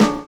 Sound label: OLD SNARE; Univers Sons